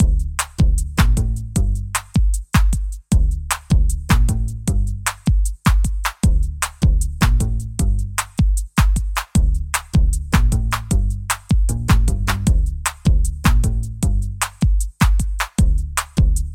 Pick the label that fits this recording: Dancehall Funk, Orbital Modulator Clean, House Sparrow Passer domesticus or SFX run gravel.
Dancehall Funk